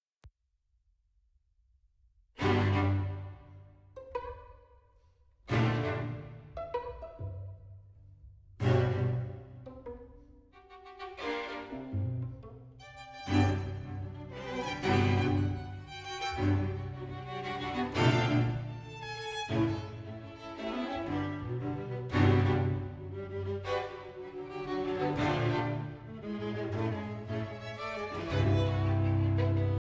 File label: String Quartet Slip